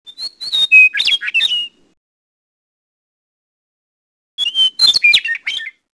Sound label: meadlark